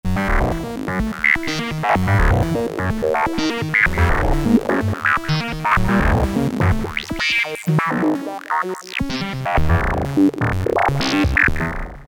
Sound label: Random, Korg MS2000; Factory Patches